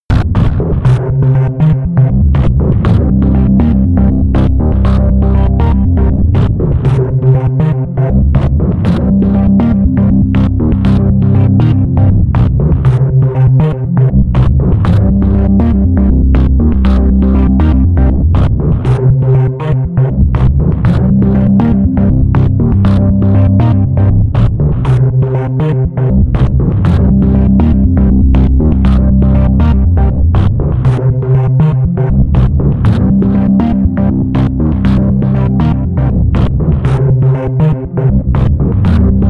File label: sequence dirty organ